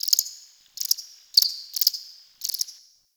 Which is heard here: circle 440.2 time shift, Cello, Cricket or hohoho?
Cricket